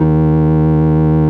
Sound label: FARF, FARFISA